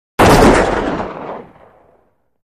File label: Rifle Shot 5 Midrange